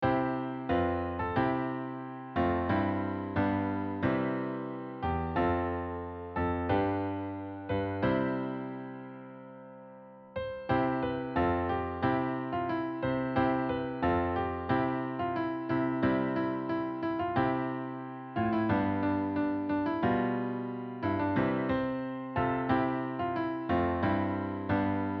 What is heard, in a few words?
joy to the world chords